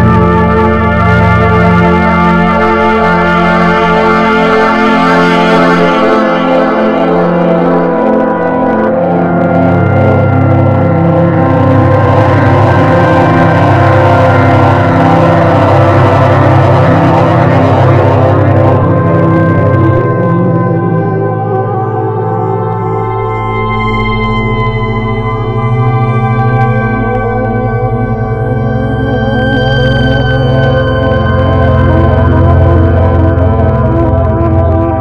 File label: phone; Loops recorded mostly with a loop pedal, others made in audacity and software of that ilk